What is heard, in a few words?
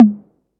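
808 Tom High; Concrete Collage Drum Kit; Concrete-808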